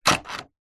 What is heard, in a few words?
leisure rc car upside down front wheels turn action click